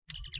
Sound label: communicator; ENT